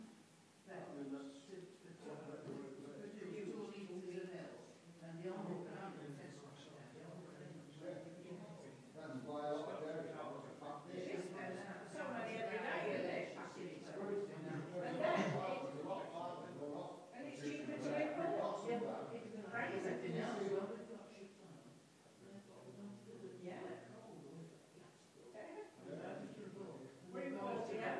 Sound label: 60 seconds of clay cross social centre chatter